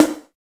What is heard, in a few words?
HI SNARE; East West - Phat & Phunky